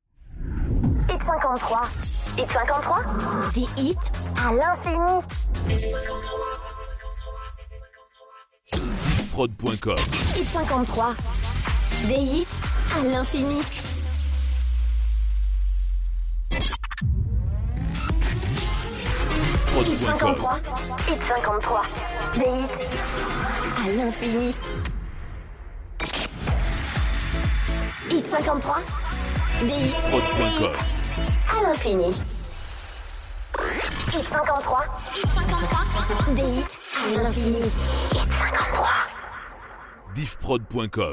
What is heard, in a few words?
Sweeps; Hit53